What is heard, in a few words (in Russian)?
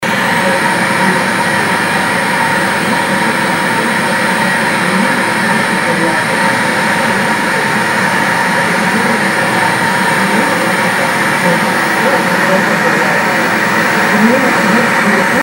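звук помех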